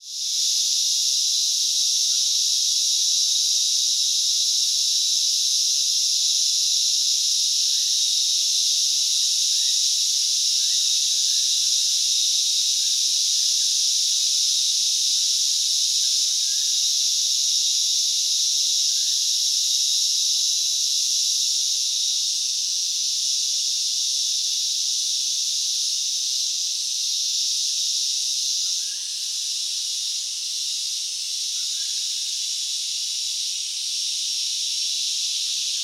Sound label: nature ambience rainforest australia day summer insects birds